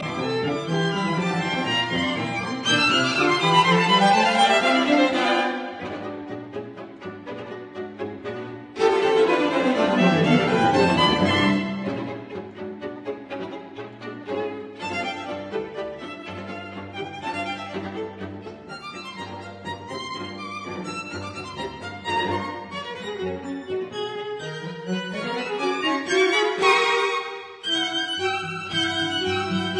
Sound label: String Quartet Vif et gai; Milhaud; Op 87